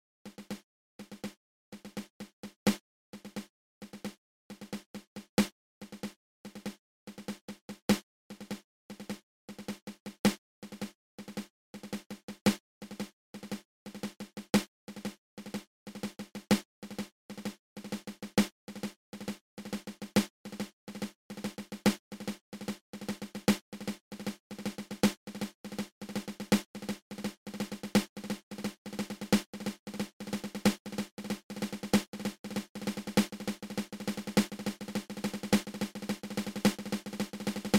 Triple Ratamacue open close open

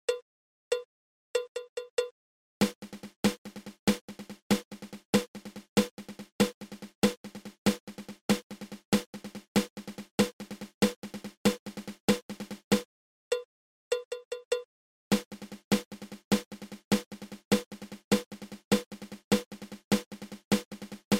Single Drag Tap triple

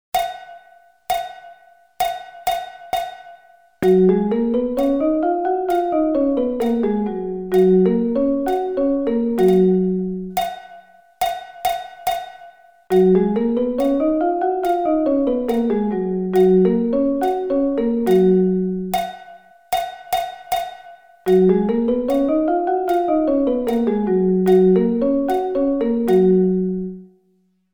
Gb Scale

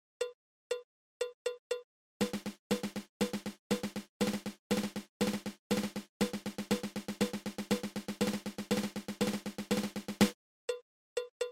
Single Dragadiddle

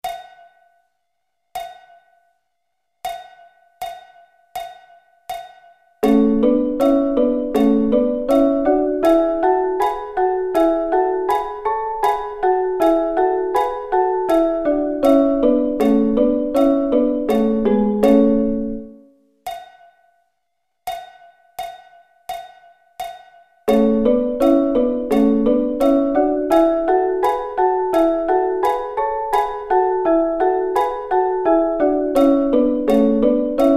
A Double Stops

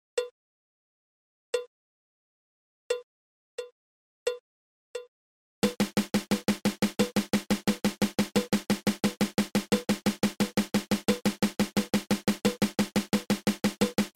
Single Stroke Roll

